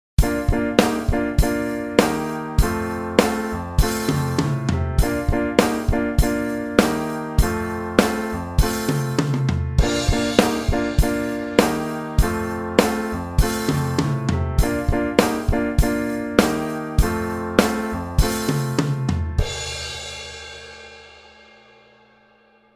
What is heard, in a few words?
rock piano chords drums